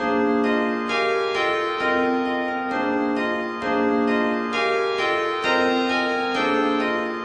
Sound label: 132 dissonant piano like melody; melodic loops; keys organ piano loops